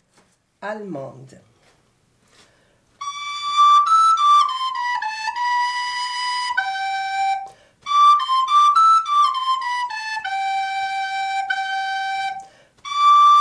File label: allemande, FLUTE